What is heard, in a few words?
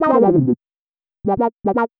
120 synth licks C, Keys, Nu Jazz House; PowerFX